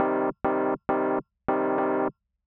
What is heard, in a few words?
Compressor